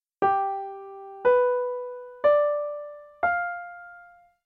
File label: Treble G7 up